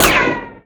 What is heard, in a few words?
rico wood, ricochet; Modern Warfare